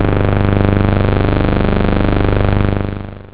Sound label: terror, synth